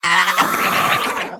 Gibberling Death

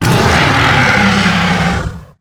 Ravasaur Death

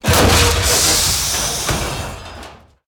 Ironclad Death

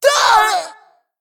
Female Dragon Death

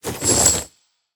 Coin Bag Play

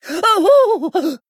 Male Steward Death

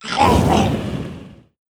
Murloc Tidecaller Death

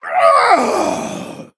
Male Human Death

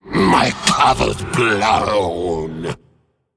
Male Faceless Attack

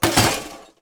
Mystery Box Death Underlay

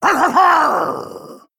Male Gnoll Attack

